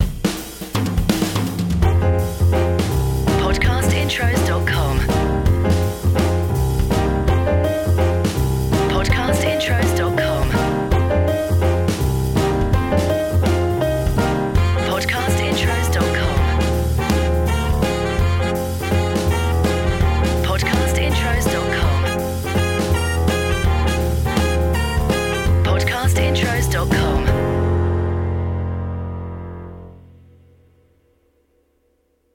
BLUES; Podcast Watermark

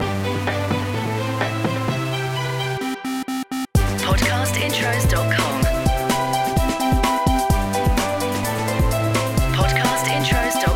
CHILLED BEATS; Podcast Watermark

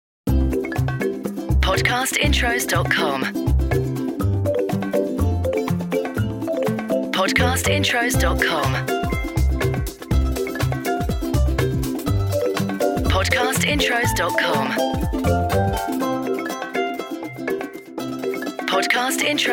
Podcast Watermark FUN

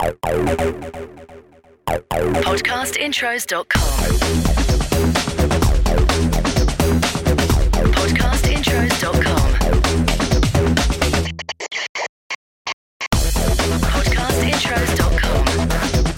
BREAKBEAT; Podcast Watermark

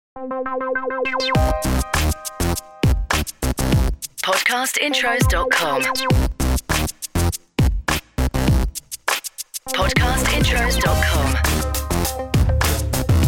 Podcast Watermark HIP HOP

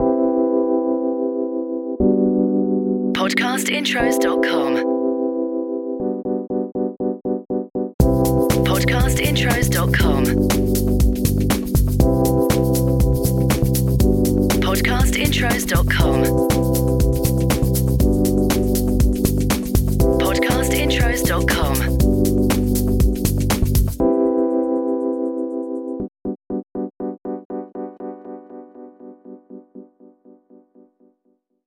CHILL OUT; Podcast Watermark

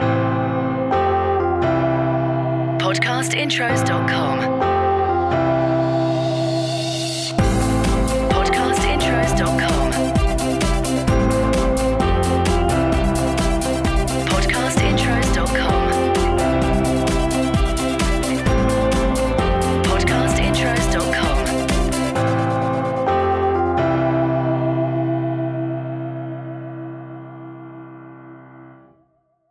CORPORATE; Podcast Watermark

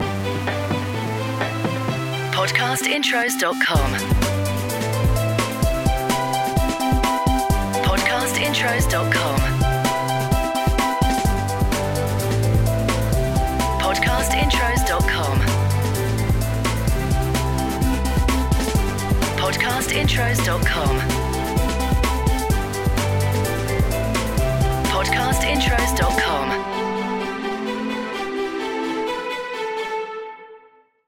MOTIVATIONAL; Podcast Watermark

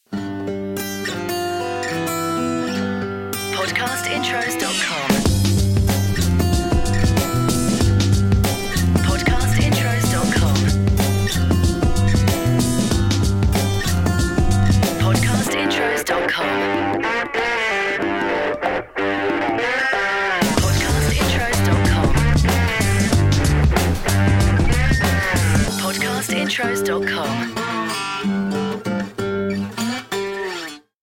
BLUES Delta Beats; Podcast Watermark

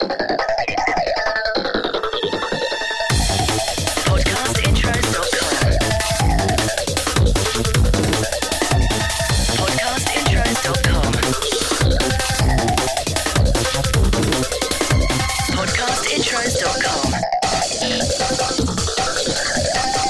BREAKBEAT; Podcast Watermark

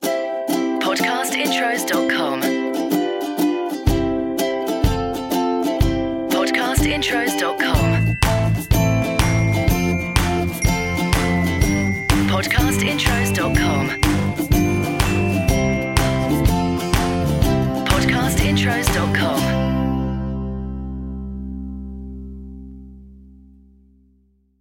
CHILDREN; Podcast Watermark

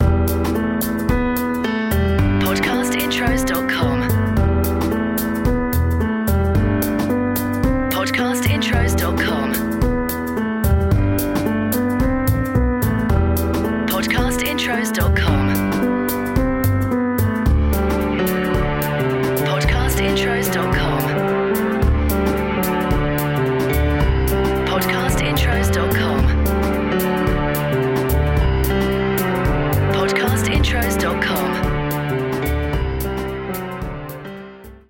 CHILL OUT; Podcast Watermark

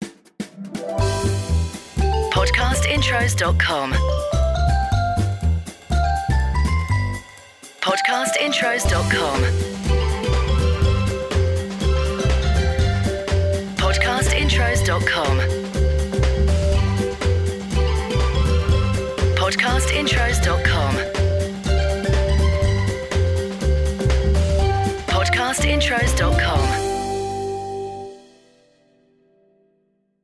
Podcast Watermark FUN

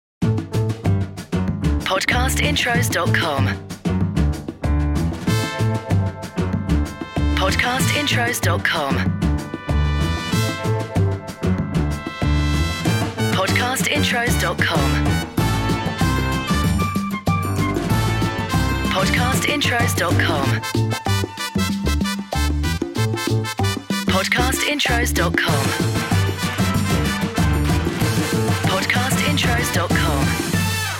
Podcast Watermark FUN Club Cuba

